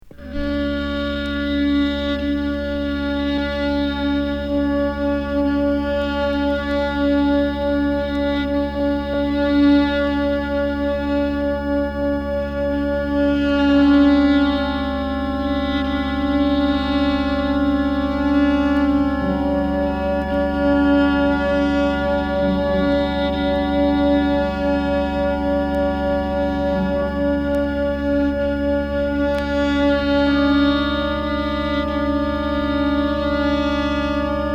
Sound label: musica nova contemplativa